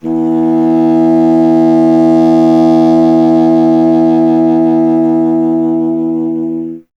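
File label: wind contrabassoon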